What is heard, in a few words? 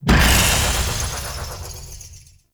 Death Explosion; Warframe; Duviri; Dax